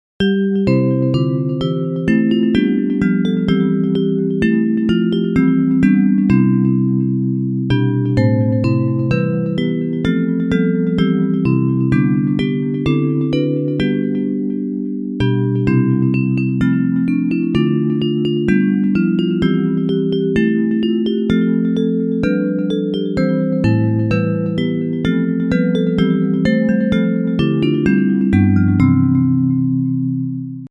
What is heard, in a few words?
Bells